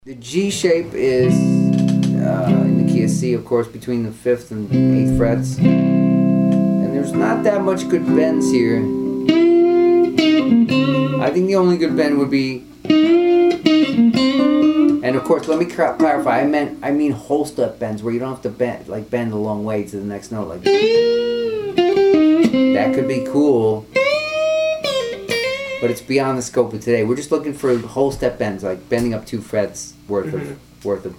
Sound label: bends g shape